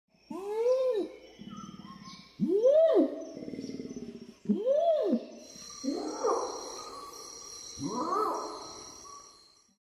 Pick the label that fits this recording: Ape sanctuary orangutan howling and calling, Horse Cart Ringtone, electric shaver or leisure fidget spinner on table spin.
Ape sanctuary orangutan howling and calling